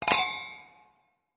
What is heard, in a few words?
Toasting Mugs